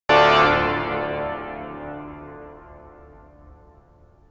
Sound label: reverb, organ